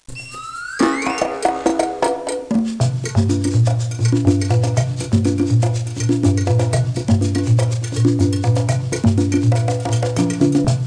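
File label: conga, loop, percussion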